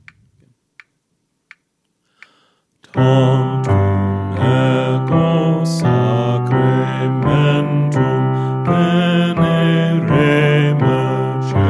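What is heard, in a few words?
Bass, Choir Practice Tracks; Hail Our Savior's Glorious Body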